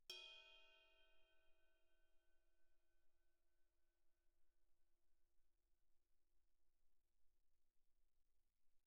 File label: ride stick bell pp, suspended cymbals; Percussion